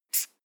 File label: marker line short soft